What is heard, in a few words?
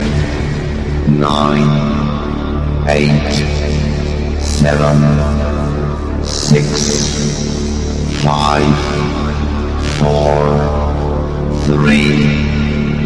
countdown electro